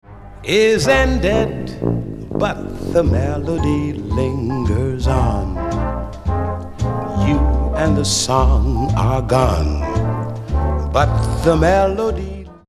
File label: tuba Mono